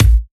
sharp bass drum one shot a key, Royality free kick sound tuned to the A note; kick drum samples